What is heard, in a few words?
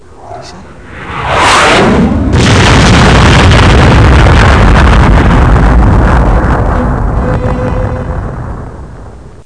MISSILE; Nebula